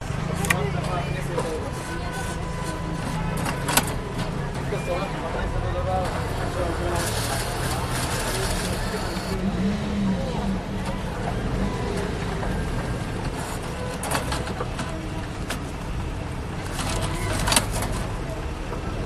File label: Collecting Money From ATM Cashmachine Sounds Walla In Background; Budapest Hungary; your free sounds